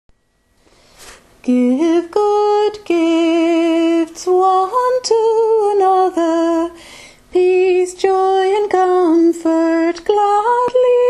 soprane voix

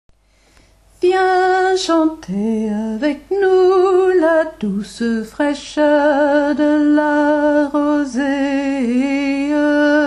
tenor voix

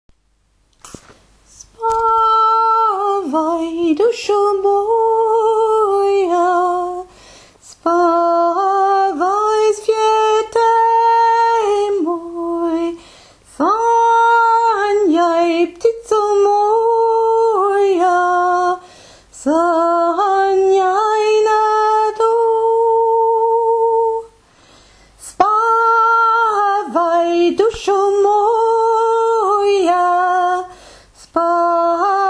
spavaj voix soprane

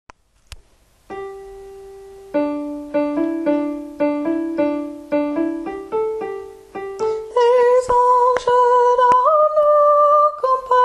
voix soprane

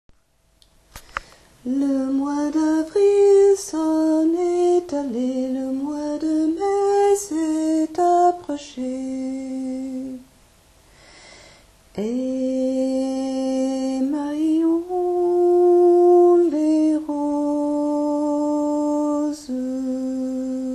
soprane-alto marions les roses

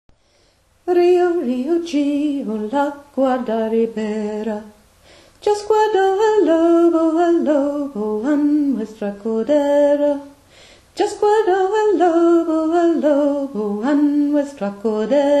riu riu voix basse

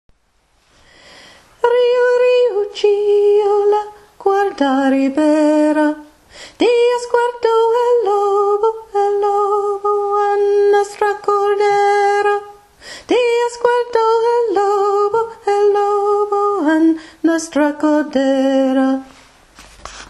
voix tenor

